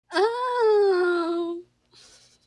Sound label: aaaaw